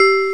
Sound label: Bells